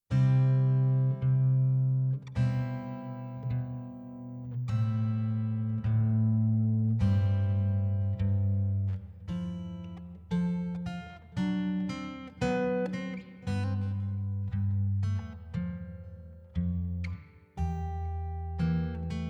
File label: solo guitar; wedding guitar soloist